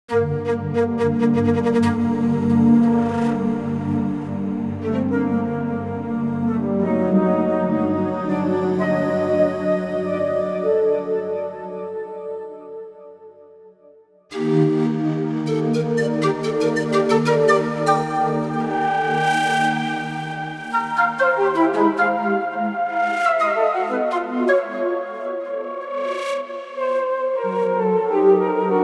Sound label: Yamaha Montage M Cinematic Flutes